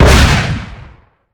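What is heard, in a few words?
rocket launcher; FPS Game; ML-Agents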